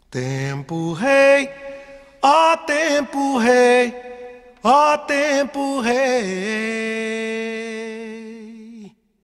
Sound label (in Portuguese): a capela só vocal